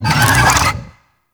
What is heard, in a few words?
Infested Big Ashen Vocal Pain; Warframe